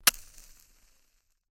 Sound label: left, binaural recording; theverge